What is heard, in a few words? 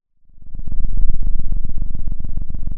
pop, Sound of a cat purring